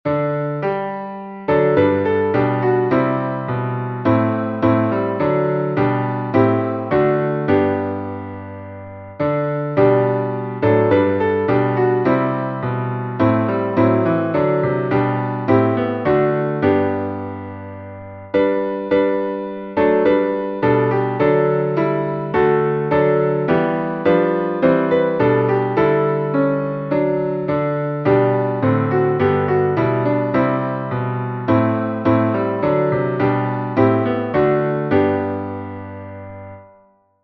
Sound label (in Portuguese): salmo instrumental; salmos 98B; Melodia: Wie lieblich ist der Maien